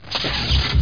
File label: shield hit